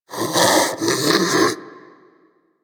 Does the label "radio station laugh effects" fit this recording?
No